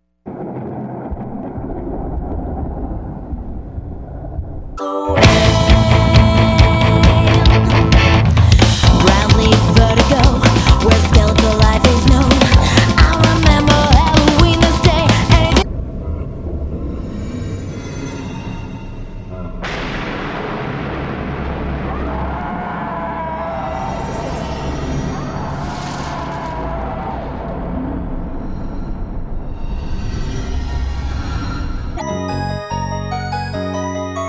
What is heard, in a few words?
music Halloween Scary Sounds Haunted Forest; 1001 Sound Effects; Halloween Scary Music and Sound Effects